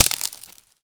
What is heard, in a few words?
cracking Wood